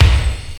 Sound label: short reverb kick drum single hit b key